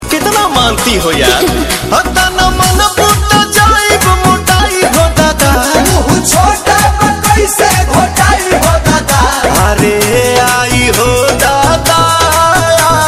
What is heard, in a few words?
DJ bhojpuri songs